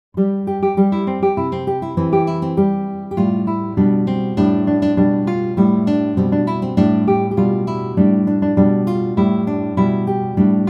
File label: Five Fingers Solo, guitar